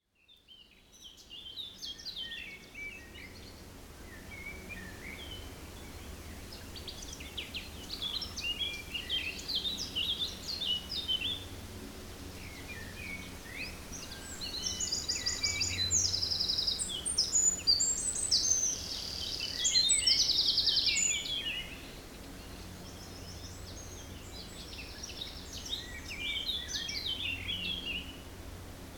Forest Spring Birds Windy 3bft

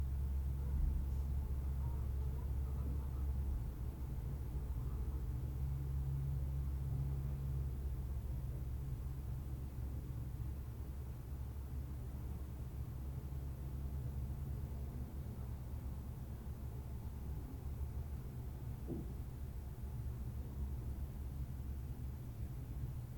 Room Tone House 12 Pm Noon

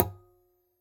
Cello; Ir With Eq

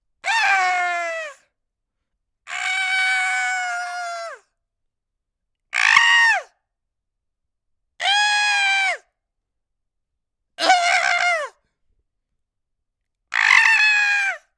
Scream

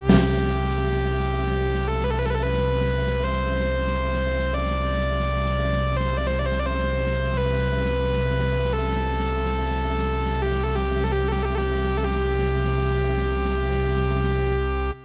Bagpipe Intro

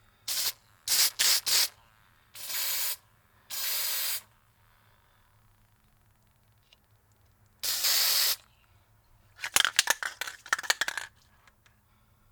Spraypaint Shake Shortspray

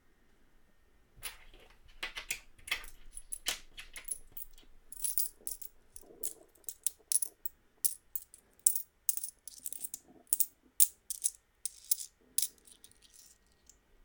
Counting Coins

